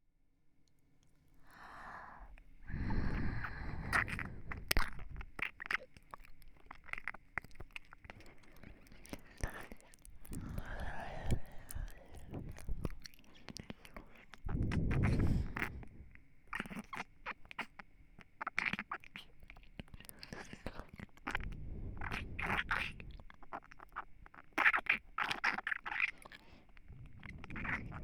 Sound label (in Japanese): 耳たぶ舐め 右